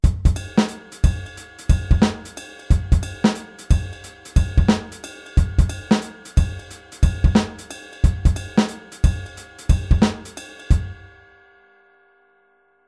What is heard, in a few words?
Groove off; shuffle offbeat